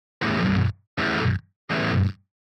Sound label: staccato chords gate 70